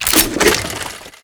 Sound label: item pickup